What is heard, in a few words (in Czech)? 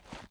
dosednuti; Mafie 1